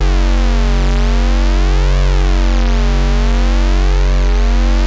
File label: BROOMSTICK; Masterbits; Vintage Voltage